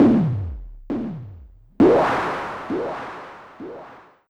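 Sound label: MINX snare drum, drum loop; Big Fish Audio; Uncivilised G'rooves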